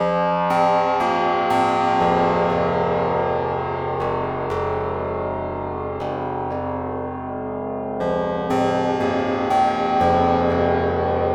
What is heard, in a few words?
Forbidden Bells